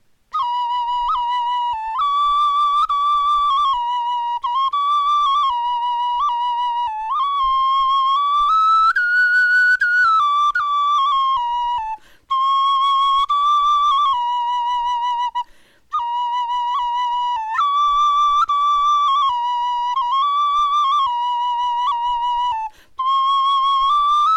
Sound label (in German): melodie, knochenfloeten